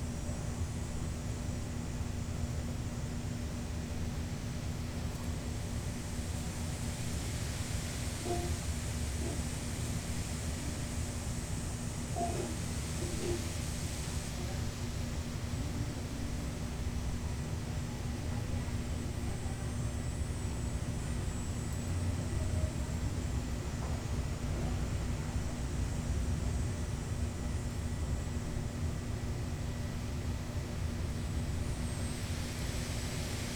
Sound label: cicadas Toronto